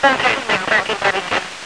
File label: static; Allegiance